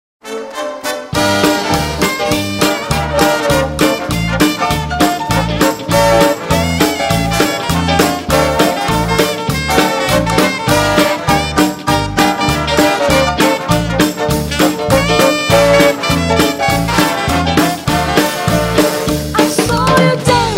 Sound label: Quickstep 50 bpm; квикстеп